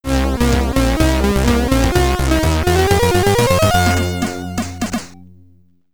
Get Ready! (SID Stereo); Scorpion; commodore 64

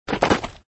Horse Step; Extra Lives

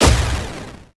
sfx gameplay; Rival Megagun; ps4

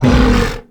Ogre Grunt; timez attack

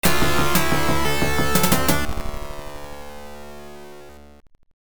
Level Start; Surf Ninjas

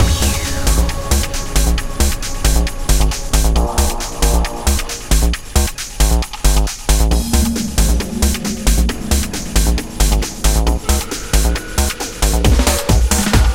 Intense; Thrillville: Off the Rails; PC console

